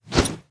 pipe swing; smite; xbalanque

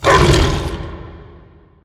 attack; smite; god scylla

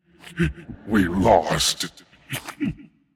boo hoo; smite; olorun

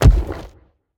defeat rock drop small; smite; god merlin

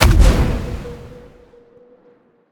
arcane explode; smite; god merlin

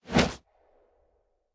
swing; odin; gugnir; smite

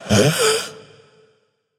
fear; smite; voicepack; kumbhakarna

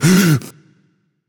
breath; Smite; camazotz

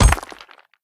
shield ground; Smite; Cabrakan

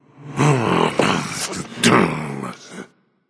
grumble

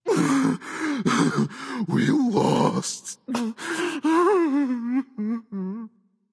boo hoo; smite

